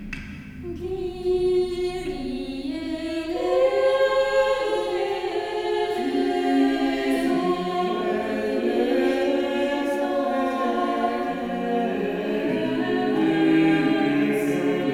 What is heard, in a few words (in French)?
messe à 4 voix kyrie; byrd messe